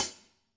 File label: real HiHat; REAL LIVE KITS; A Real Freakin Drum Kit